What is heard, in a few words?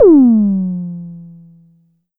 Drum X Tom, Pearl